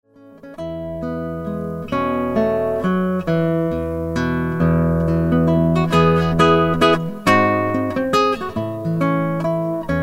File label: Waltz